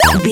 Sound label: retro damage hurt ouch